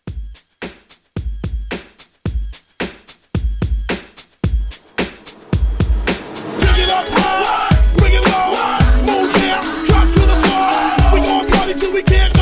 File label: 90s Re Drum 110